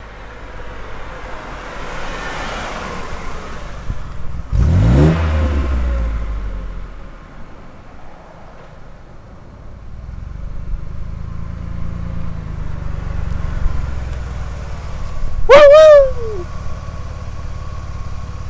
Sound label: Ford Taurus Magnaflow